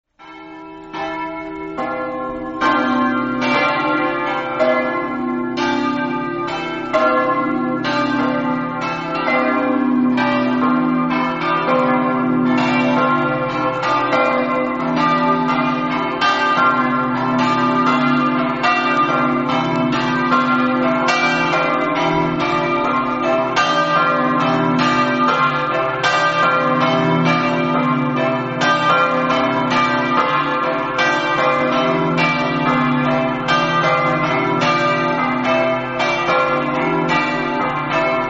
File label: campane; premariacco